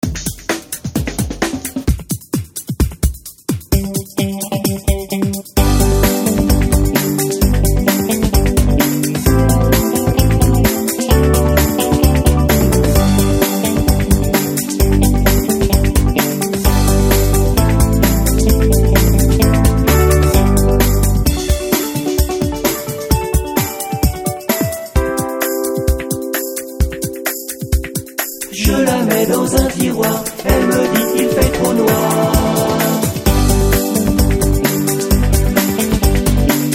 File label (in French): chanson pour enfants playback; SOURIS VERTE